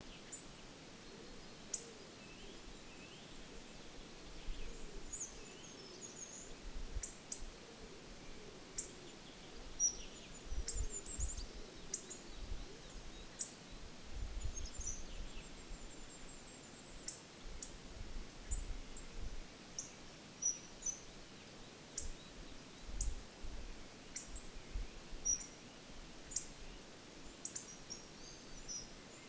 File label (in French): Grosbec casse noyaux; Issaux